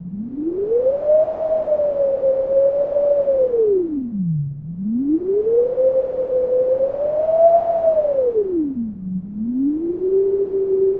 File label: Wind; supercollider